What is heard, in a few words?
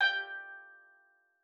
G4, STEELDRUMS; Xsample VOL-4